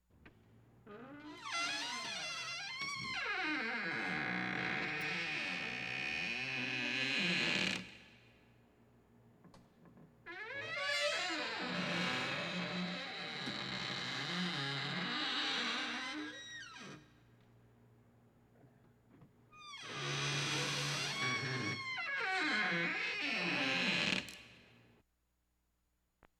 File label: squeaking door